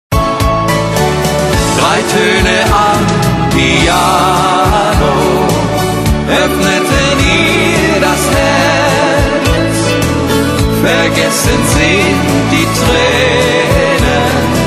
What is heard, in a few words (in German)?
Piano